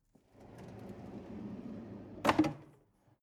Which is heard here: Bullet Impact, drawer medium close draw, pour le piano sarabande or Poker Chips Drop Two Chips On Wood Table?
drawer medium close draw